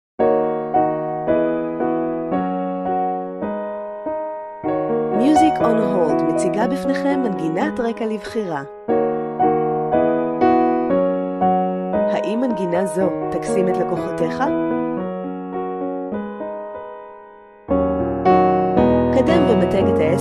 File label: jazz love